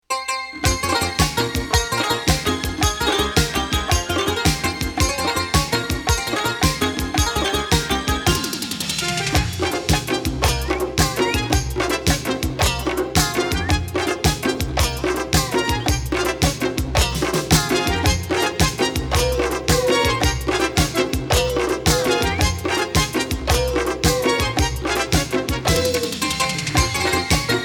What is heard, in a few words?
Instrumental